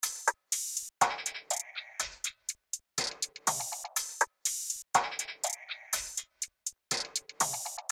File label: RnB Melody Loop Fmin 122 BPM Perc